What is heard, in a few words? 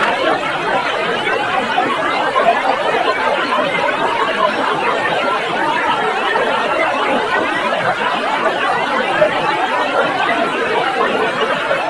laughter